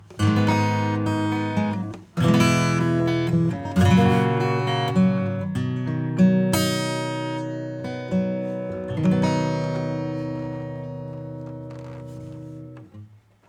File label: acoustic